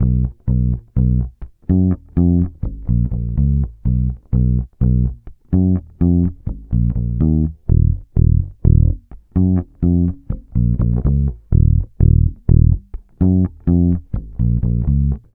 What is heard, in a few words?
Bass loop 125 Ebm, Electric Bass Loops Direct; Slate Digital BASSHOLE Sample Pack